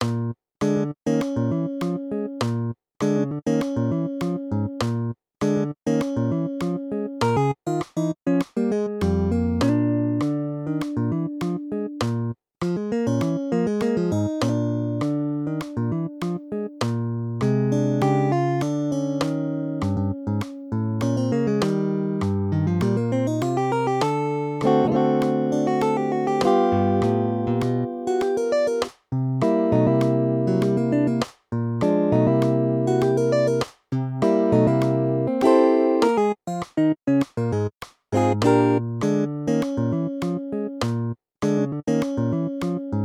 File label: duet, guitar; 4/4